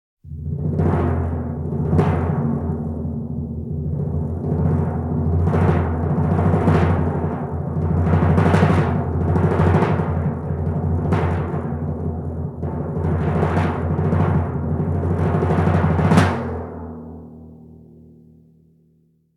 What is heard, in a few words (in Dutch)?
Trommelslag; KMP Film Video Sound Effects